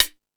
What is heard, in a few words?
House Machine, Drum Machines